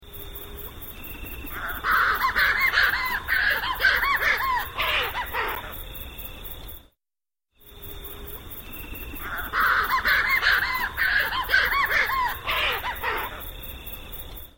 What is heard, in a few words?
Newells Shearwater; Sounds Hawaiian